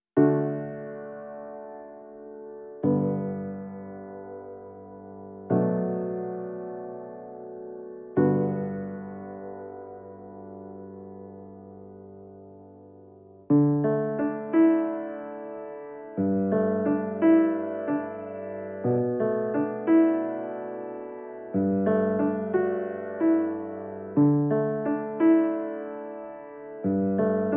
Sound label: Piano Only